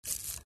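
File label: sounds of grasshopper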